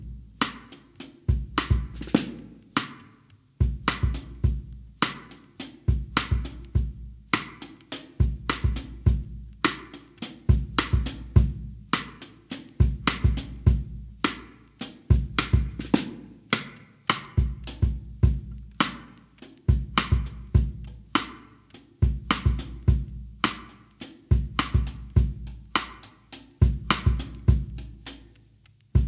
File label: Drum Break